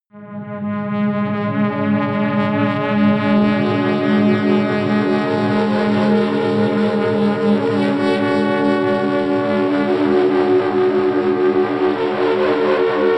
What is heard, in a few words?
drone crazy elephant